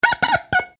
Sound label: Finger Squeak, Finger Squeak On Ceramic Plate; Wet Finger Squeak On Ceramic Plate , Quick And Jerky; Squeaks; Objects